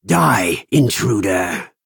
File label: attack; swims-at-night